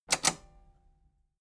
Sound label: metal button robust spring click disable fast